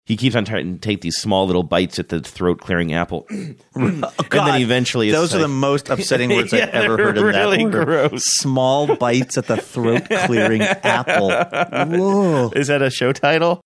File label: small bites at throat clearing apple